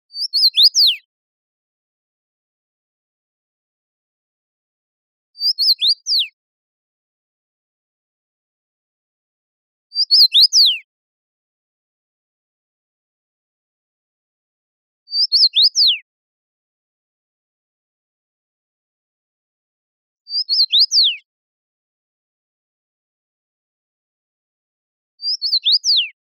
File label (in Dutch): Roodmus Carpodacus erythrinus